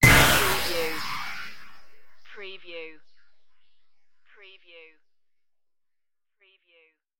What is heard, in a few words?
futuristic weapon strike